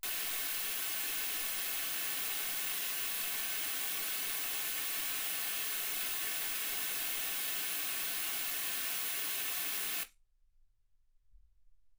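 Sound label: white gaussian noise 48kHz; single mic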